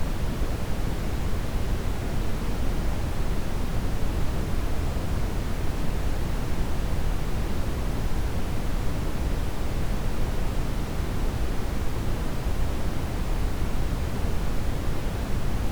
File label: Brown Noise; Artificial Noise Floor + Noise Pollution